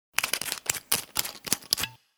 Unscrewing Bottle Cap, Unscrewing cap off a bottle; Twists; Objects